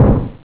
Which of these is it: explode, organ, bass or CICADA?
explode